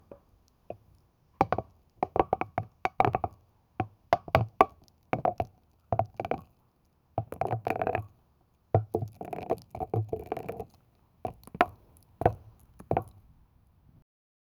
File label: a plastic cup of coffee